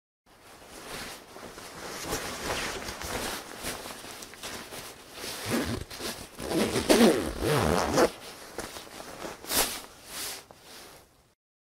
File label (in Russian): nadel kyrtku zastegnul; Звуки куртки, штанов и вещей в шкафу на вешалке